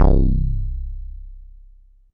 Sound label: MULTIBASS, Dance Mega Jungle Rave